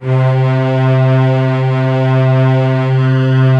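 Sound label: U220 string; Greytsounds; Scott Peers Sound Engineering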